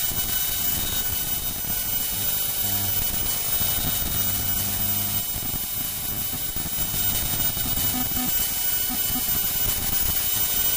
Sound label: bgnoise; tsuchiura